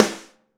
ST AMB SN, STEREO AMB SNARE

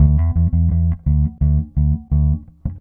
Static Bass

